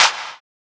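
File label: snare clap, Kawai ACR-20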